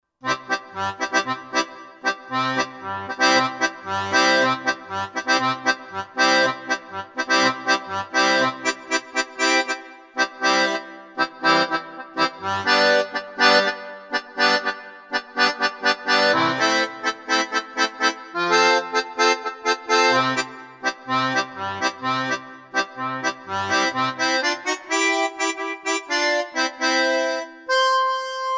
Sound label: Squeeze Box; Country; Roland SR-JV80-17 Country Collection